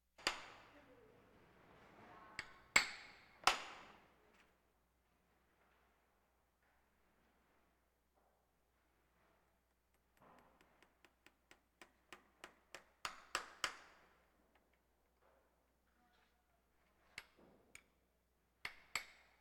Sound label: Animal effects snorts grunts farm ambience